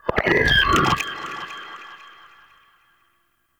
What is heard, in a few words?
Shrimp Vocal Pain; Creatures; Vocals; Baliq Shrimp Glade